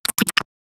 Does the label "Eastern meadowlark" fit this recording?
No